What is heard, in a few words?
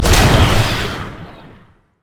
surging tides; nami base